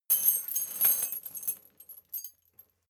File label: metal chain midsize handling jingle